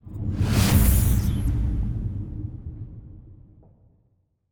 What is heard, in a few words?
cruiser move; aquatics